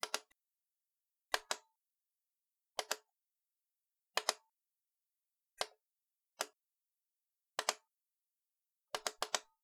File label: Torch Button Clicks